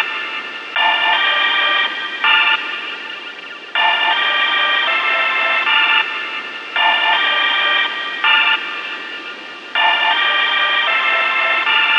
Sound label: Synth 160bpm Dm Lead Trap